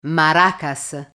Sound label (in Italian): maracas